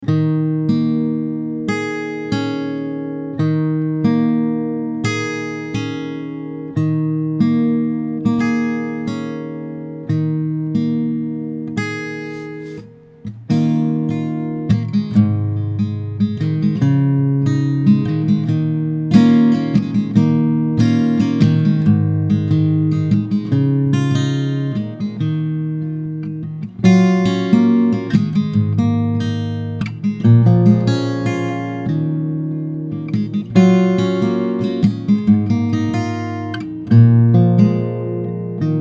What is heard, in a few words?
D m143 GTR sample